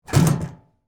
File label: Trash Bin Lid Open, Industry, Trash, Bin, Lid, Open, Metal, Large; Garbage